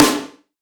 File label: Acoustic Drums - Snare; Antidote Advent